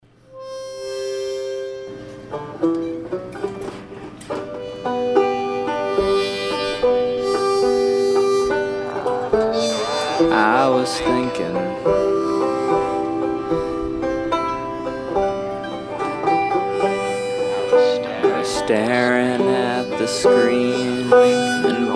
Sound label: banjo lament